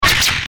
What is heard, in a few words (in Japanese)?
会心の一撃